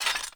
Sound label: Foley Action Metallic Punch; Arm